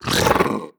shake subtle, headcrab reviver; creatures; vox

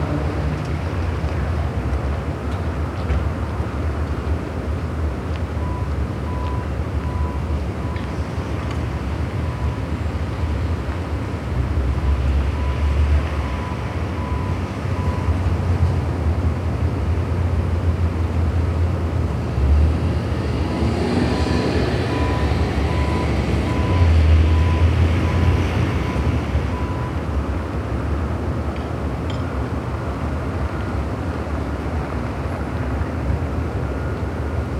exterior, ambient; nuke

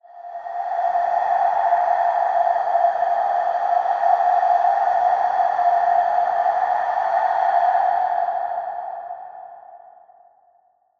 amb skull breath; ambient air; Half Life Alyx

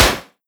metal sheet, bullet impacts; Half Life Model Pack

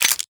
aug zoom in; weapons; Counter Strike Model Pack; CS2

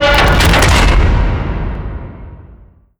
foreshadow, destruction smokestack; Half Life Alyx

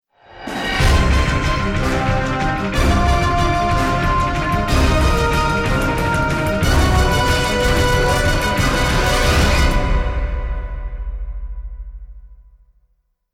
round ten sec count, music; Counter Strike; CS2

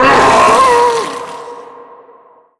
death, zombie vox; creatures

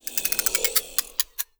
manhack death warbling; npc; Half Life Alyx

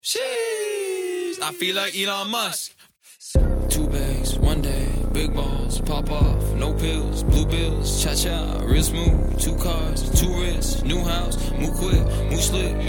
bomb ten sec count

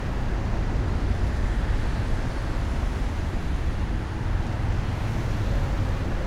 office outside traffic; ambient; atmosphere; Counter Strike Model Pack; CS:GO

